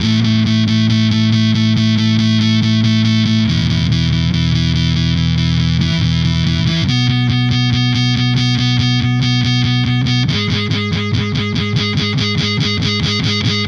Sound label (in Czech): Basova kytara